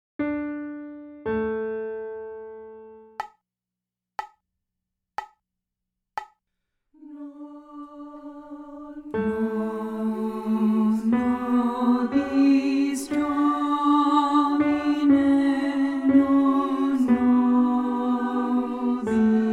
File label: Non Nobis Domine Alto; Byrd; ed. Battle